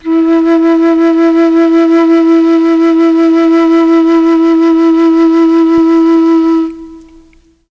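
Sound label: E2 chamberlin flute, mellotron; CHMBLN FLUTE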